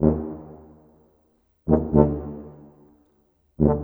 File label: Trombone Tuba; Sonic Foundry (Sony Creative Software); Orchestral Series VOL-4 - Rock and Pop